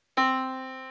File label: Yamaha Disk, piano sound